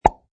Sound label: Cartoon Pop